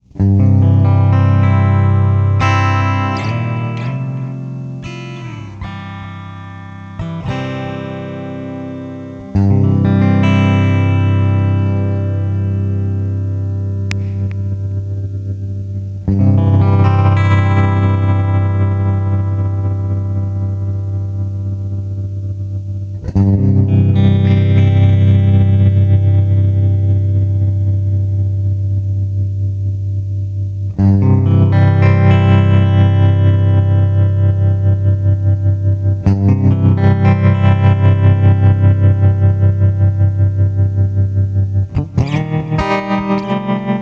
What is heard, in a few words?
dearmond tremolo control